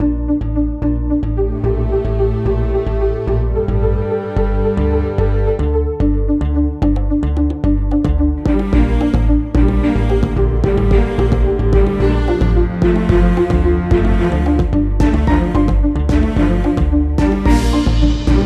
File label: PUZZLE SOLVED; escape academy; Introductions